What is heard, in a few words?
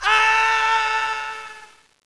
fall